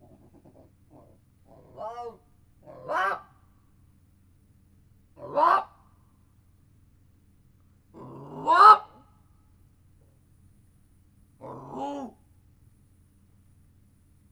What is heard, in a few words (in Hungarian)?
öt kiáltás, mocsári hiúz; miskolci zoo 2009